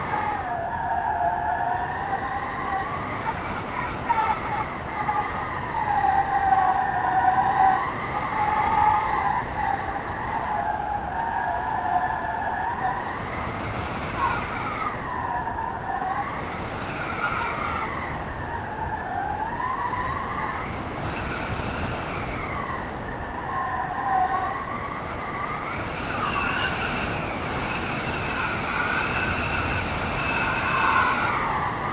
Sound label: wind harsh mountain loop; sonniss gdc